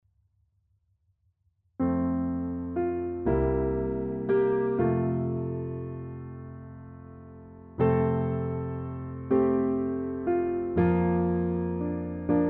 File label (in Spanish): piano